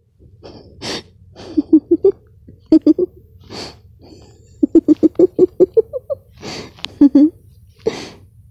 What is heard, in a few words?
Hehe